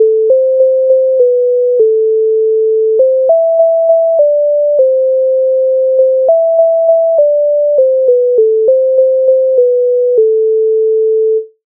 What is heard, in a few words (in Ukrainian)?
Українська народна пісня, MIDI; Ukrainska narodna pisnia; Nese Halia vodu